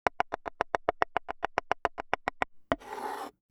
Chopping, Environment

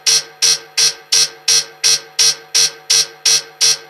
attack fx alarm; SpaceshipDemo

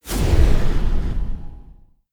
Fly By; Megacity

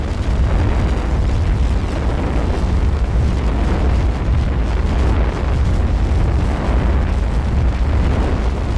Black Hole Generator; SpaceshipDemo

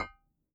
Pots

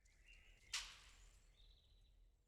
forest motion, Night; FontainebleauDemo

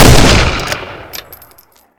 rifle; ML-Agents; FPS Game

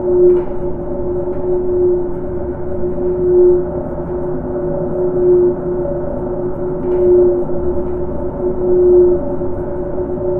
amb loop high roll; room 3; ambient

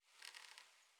wood strain, Night; FontainebleauDemo

